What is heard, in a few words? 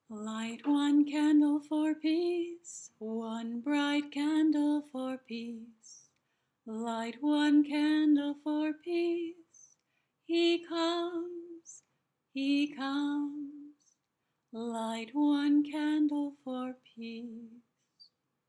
Advent Candlelighting Song